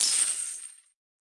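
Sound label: Goblin Baby, UI音效; 哥布林; 部落冲突; 皇室战争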